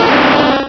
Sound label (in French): Cri